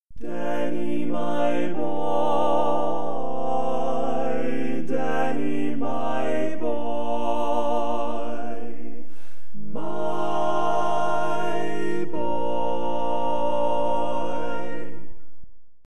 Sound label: Lead